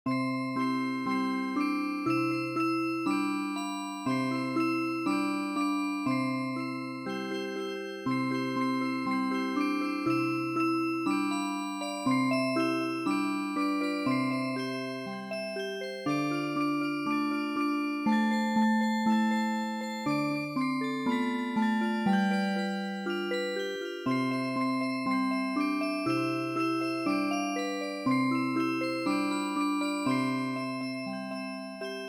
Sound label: Music Box; midi; traditional